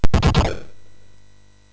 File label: SFX, MOS6581; Narco Police; hvsc